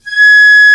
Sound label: Piccolo mf G#4; Digital Sound Factory Virtuoso